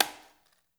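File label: brush conga; Spectrasonics; Liquid Grooves 1 & 2; CONGAS+BGOS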